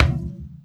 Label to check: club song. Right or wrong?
Wrong